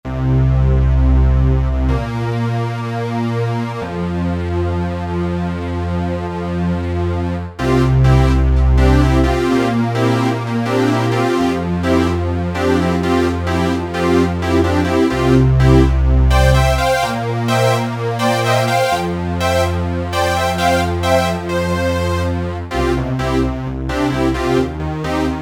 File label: E-mu Proteus VX; Big Planet